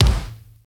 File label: 2000s rich top end kick one shot a key, Royality free bass drum sound tuned to the A